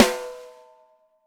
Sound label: 6X12 ALUMNUM, Living Drums